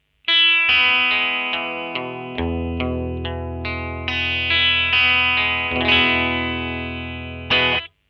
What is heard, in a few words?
jm 1999 bridge